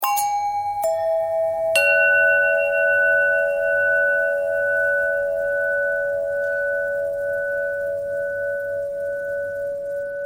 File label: long bell bend threads